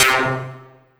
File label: SYN, 160 BPM, Drum & Bass